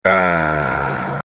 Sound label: deep exhale